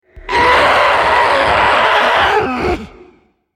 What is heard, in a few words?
dying; Greenterror; Character Voices - English